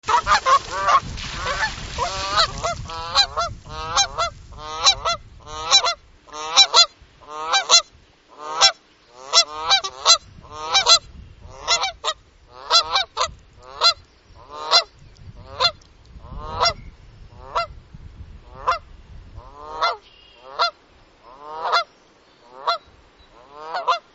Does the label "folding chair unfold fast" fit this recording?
No